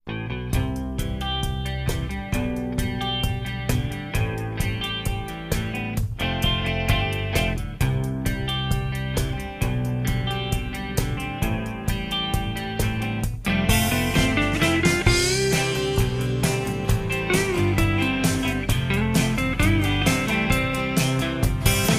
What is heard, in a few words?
BGM; Adventure Player; psp